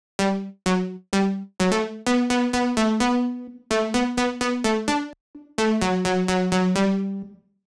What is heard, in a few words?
128 BPM E Min Lead; House; Drop Loops; Loop Stems; Cymatics; Volt